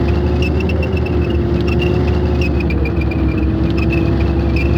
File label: tank loop; WAR